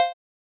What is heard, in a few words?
Game retro menu move